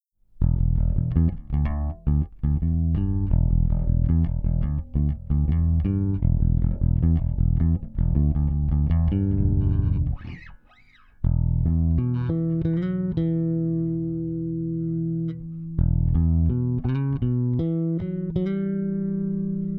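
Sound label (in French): reglage plat gain fort compresseur doigts ACTIVE, Bass; smpro; pr8; oc8; tb101; sm-pro-audio; PR8-MKII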